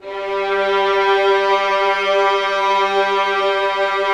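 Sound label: 1st violins sustain g3; Sonatina Symphonic Orchestra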